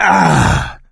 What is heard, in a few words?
hurt minor, survivor voice; Left 4 Dead 2; coach